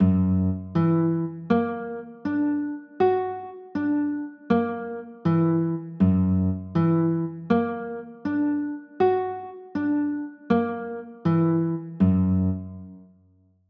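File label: G flat 7 sharp 5 chord, guitar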